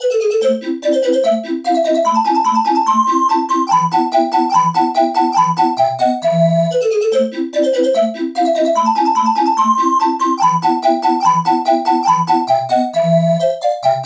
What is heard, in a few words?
bottle chiff